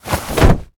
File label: headlock; battle